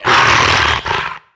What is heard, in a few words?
bite attack; DAoC; Toa